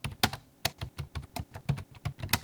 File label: typing normal; Apotheorasis